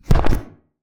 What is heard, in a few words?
footstep; Barotraumatic; Crook